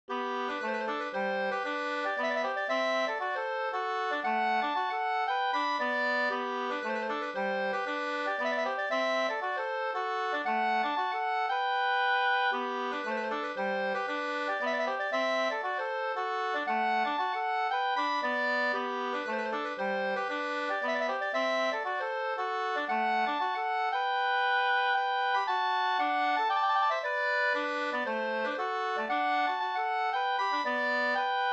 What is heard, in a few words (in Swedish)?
hamburska